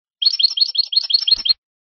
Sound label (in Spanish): bibleos golpeados finos; campero